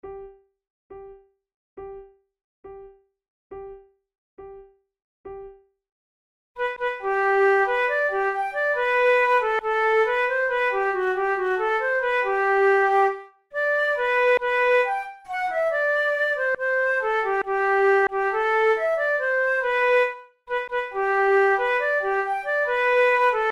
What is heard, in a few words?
duet, flute